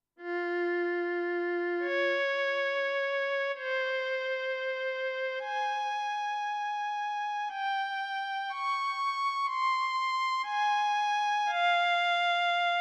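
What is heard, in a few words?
Slw Atk Violin, digital piano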